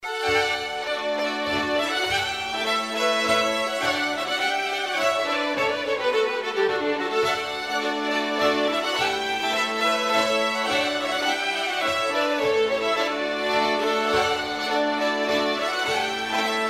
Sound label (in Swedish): Polska